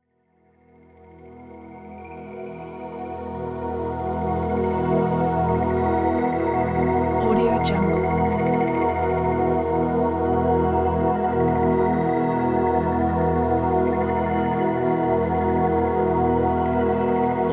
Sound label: fantasy planet